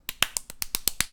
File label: clap solo girl